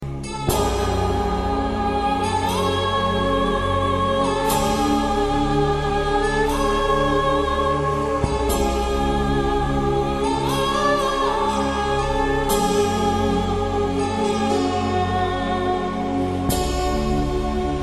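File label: Tai Chi Message sound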